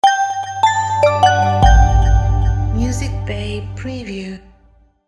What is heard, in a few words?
Bells Logo